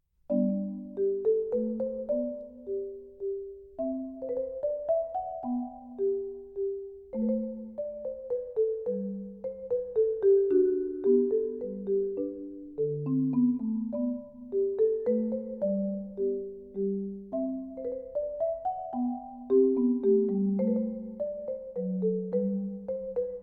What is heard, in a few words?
Menuet; Bach